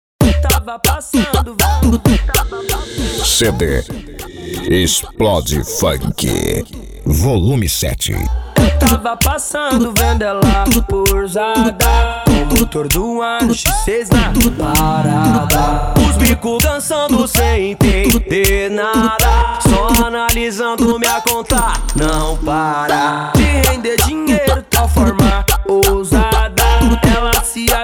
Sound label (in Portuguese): explode funk